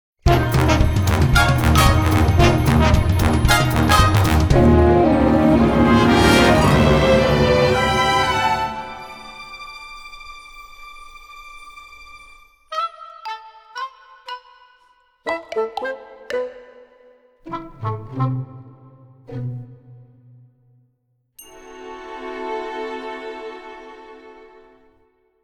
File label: transition